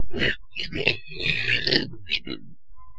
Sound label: alert; sawer; boss